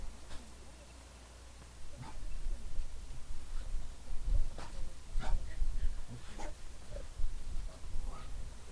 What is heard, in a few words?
greets, wolfdog